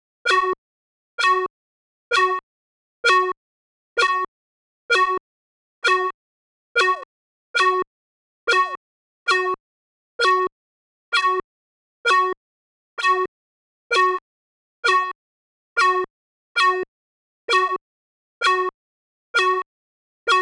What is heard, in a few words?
EXIT FIRE DOOR ALERT